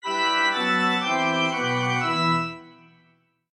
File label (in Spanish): Organo Terror